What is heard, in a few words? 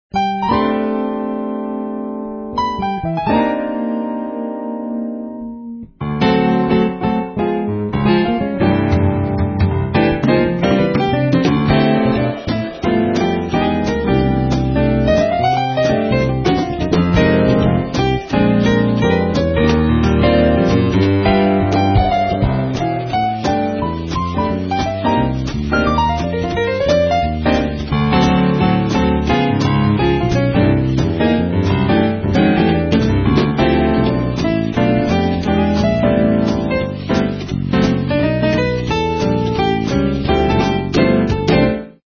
Jazz Ensemble, instrumental